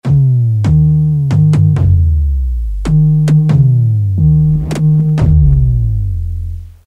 Zip n Boom Bass; Roland M-DC1

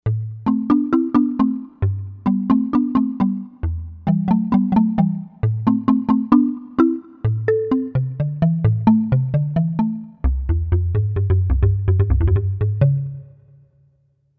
Amazon Natives, Novation UltraNova; Factory Patches

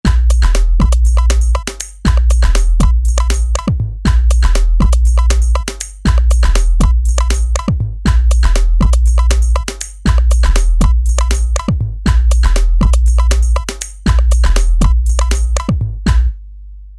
Techno

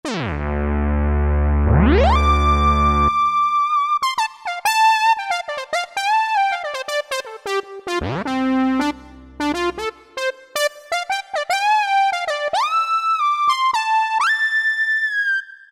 Doctor Solo, Roland SC-50 Sound Canvas; Factory sounds

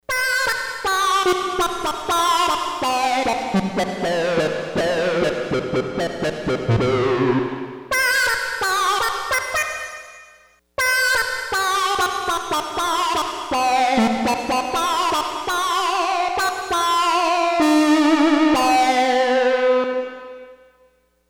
Quacky Sync, Prophet-5; Sequential Circuits Prophet-5 (Ver.3)

